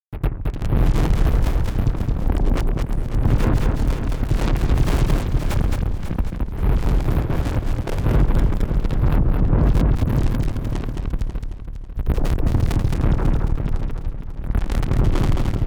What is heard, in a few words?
kick explorations